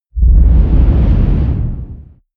whoosh tremendous wing beat; swooshes-swishes

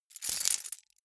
key ring large rattle air hard; objekte; schluessel

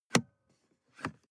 sports car int switch press and release

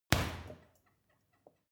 boxing punching bag hit wiggle; sport-freizeit; boxen

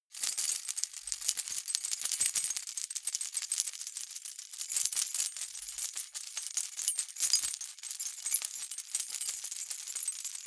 key ring large rattle air sequence medium; objekte; schluessel

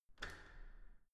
entrance door handle down soft

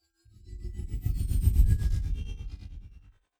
sci-fi ambient security scanner; swooshes-swishes

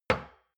shot glass place table medium; soundpool

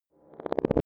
sci-fi flashback; swooshes-swishes

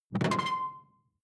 sci-fi alien console; maschinen; signale; soundpool

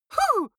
female fight "huh"; vocals; comedy-cartoon; soundpool

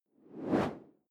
whoosh simple steady

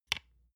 lotion large lid close soft; berufe; friseur

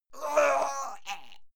male fight pain; soundpool

